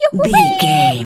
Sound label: voice fun character vocal crazy